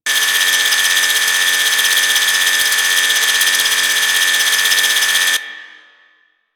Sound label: Spark Rifle Wind Loop; Grineer